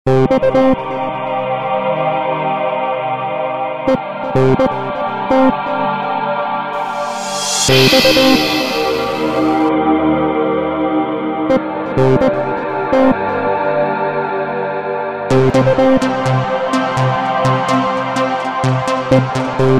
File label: chip, Electronic Noise